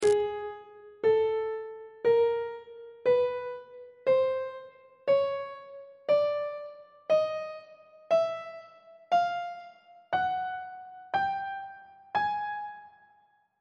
g sharp mixolydian mode chromatic scale 1 octave on treble clef